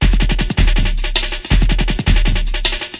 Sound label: 161 bpm machinegun, drum loop